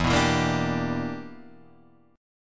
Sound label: E Minor 7th Sharp 11th E; strummed